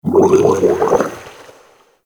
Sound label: Inked Humanoid Large Pain; Enemies; Warframe; Shadowgrapher